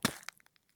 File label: run asphalt; Escape from Tarkov